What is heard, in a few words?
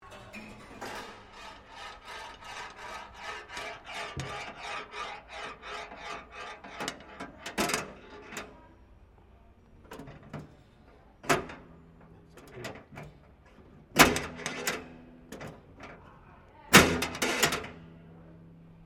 SLOT MACHINE SPACE SHOT SKILL TESTER OPERATE, Various old coin slot machines; Slot Machine Sound Effects